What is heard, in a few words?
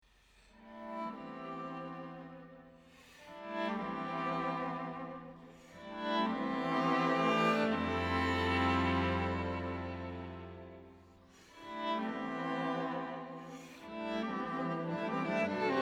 String Quartet